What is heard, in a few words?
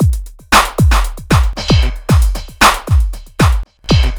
Funky at 115 w Claps, Drums Beats